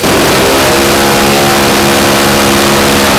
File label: cerberus fire; tf2classic